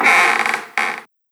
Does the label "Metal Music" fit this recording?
No